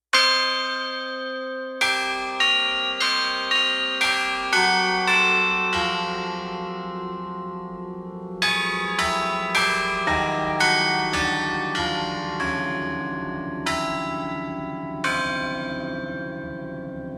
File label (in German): alles Playback